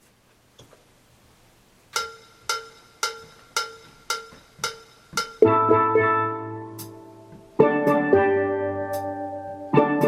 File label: Alto Intro